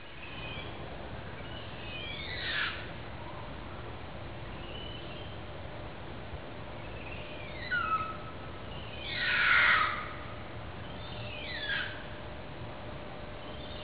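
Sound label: jungle ambience; valve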